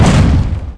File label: fall; opentdm; atst